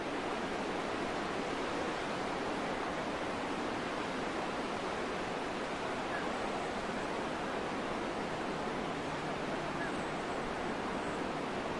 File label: Vast ocean